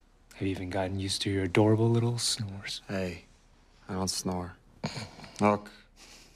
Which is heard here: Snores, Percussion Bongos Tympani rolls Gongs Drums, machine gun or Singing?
Snores